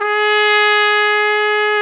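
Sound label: Trumpet, Solo Brass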